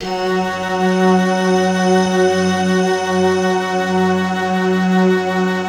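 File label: F#3, JAPAN FLUTE; Best Service; Advanced Media Trax Modern Composer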